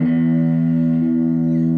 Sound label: electric